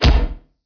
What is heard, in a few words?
body drop; darkfuture